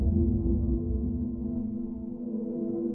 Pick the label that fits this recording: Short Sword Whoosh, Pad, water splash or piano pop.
water splash